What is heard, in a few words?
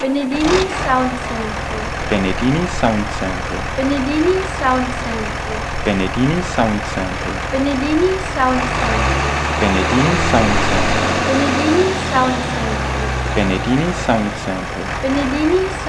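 John Deere 8410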